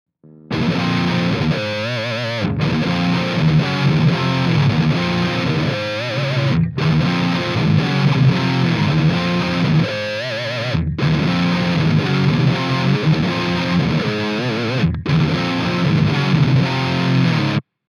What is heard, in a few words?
mesa triple rectifier mega distortion